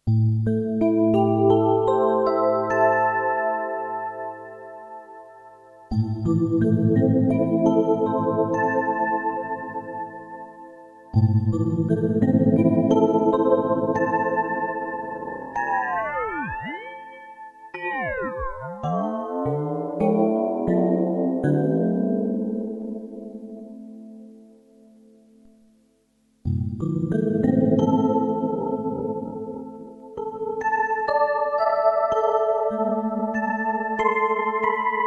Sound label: Exp Sweeps LFO